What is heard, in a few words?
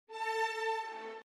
Ashibue